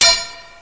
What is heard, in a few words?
AR MET; Dark; thief